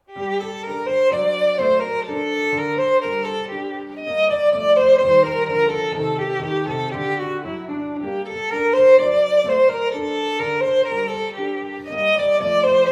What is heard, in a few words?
Gavotte In G Minor, Violin